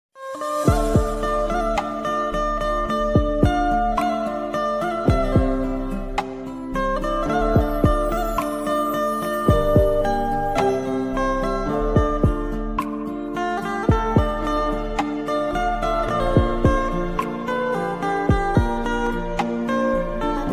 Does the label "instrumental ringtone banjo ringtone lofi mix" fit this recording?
Yes